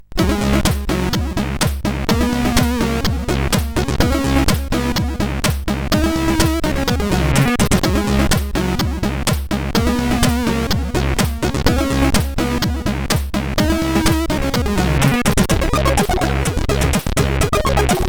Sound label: Music Test, sid CSG8580R5